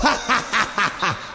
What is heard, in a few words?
hahahahaha, male; MALE MISC and SPOKEN WORD